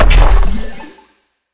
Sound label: longfall land, footsteps; player; Portal 2